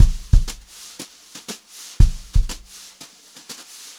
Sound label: 120 JZ BEAT, Brushed Drum Loops; USB Soundscan vol.39; AKAI; JAZZY